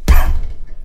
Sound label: punch